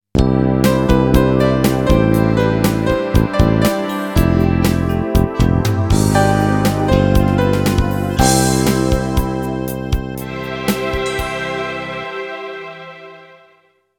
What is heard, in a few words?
School Bus Completed; easter bunnys big day ps1 gamerip 2003